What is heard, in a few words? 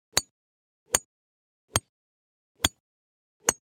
golf club wood; stereo; soundscrate